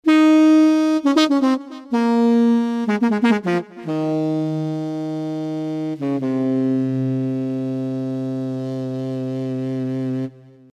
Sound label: sax korg z1